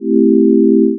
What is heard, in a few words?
pipe organ